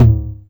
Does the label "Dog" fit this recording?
No